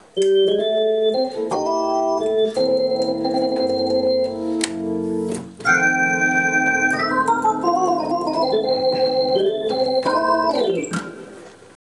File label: lick, Hammond